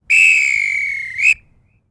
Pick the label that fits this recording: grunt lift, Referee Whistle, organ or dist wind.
Referee Whistle